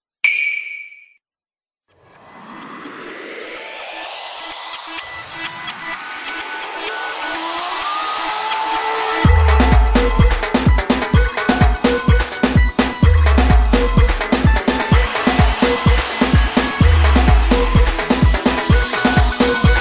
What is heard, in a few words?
floor music; emma stevenson